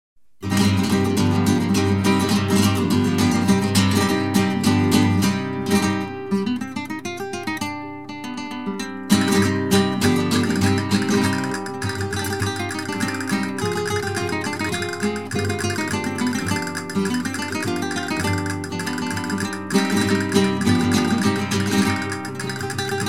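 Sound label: Sevillanas, flamenco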